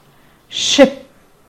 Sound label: Ship